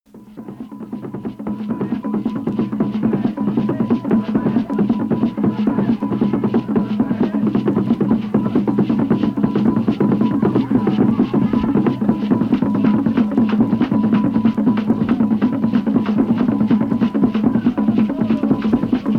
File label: Ghanaian Drumming